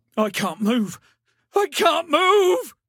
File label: town human far; Blind Drive